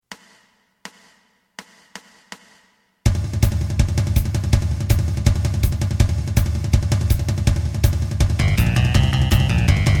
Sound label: lead